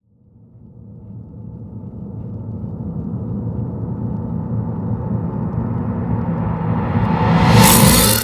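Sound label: Reverse Long Scrape, Cinematic Metal Impacts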